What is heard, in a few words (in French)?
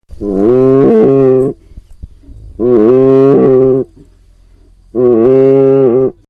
vache